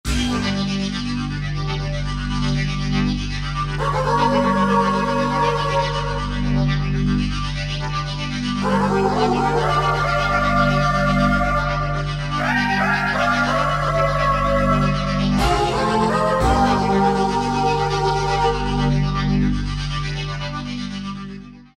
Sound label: Alternative 880, Roland SC-880; Factory Patches